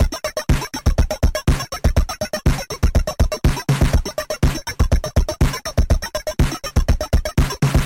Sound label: SWINGING PERC